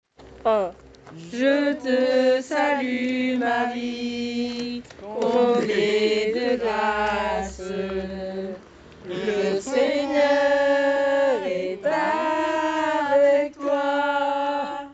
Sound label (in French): Chant Marie